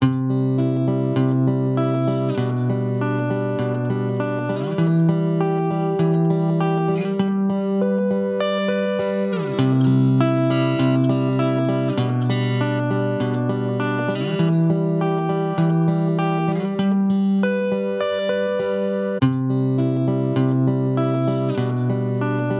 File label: guitar arpeggio test ch rv dry